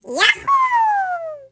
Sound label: flutter jump; Yoshi; Super Mario Sunshine